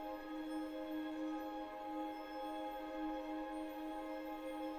main Game Chord Ab; fairymirror

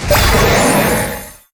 bonus win; koi princess

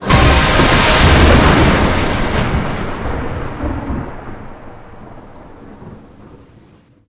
epic Win; parthenon

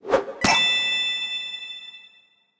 random Wilds Fall Down; finn

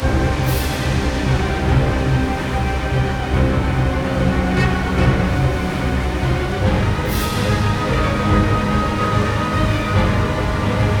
free Spin Music; the wolfs night

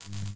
bet line hover; spacewars

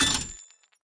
Coin; colossal pinatas

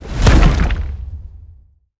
nudge Large; templeofnudges

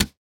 reel Stop; football

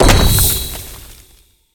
gorilla mask land; gorillakingdom

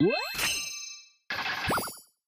free Fall Lock; magic maid cafe

